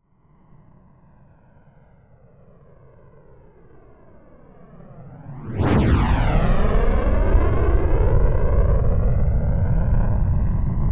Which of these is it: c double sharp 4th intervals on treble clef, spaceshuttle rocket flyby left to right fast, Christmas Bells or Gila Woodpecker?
spaceshuttle rocket flyby left to right fast